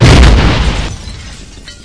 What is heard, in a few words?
explode, weapons